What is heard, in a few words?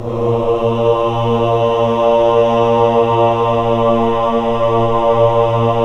CHO AH, Choir of Ahs; Roland - LA Composer vol. 1